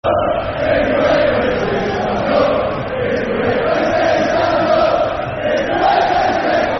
Stand Up If You Hate Wednesday [Football Chants (Soccer Songs)], Barnsley